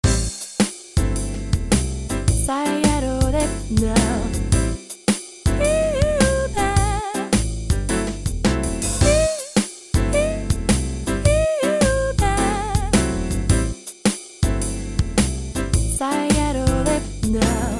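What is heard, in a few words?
cakewalking, prepared rhodes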